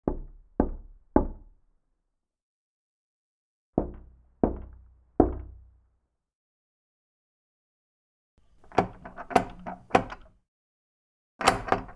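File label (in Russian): стук в дверь сначала рукой потом ножом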